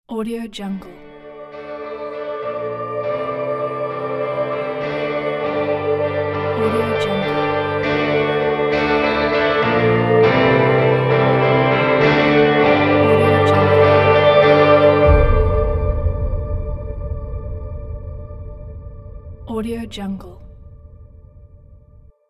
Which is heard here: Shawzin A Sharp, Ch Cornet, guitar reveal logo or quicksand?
guitar reveal logo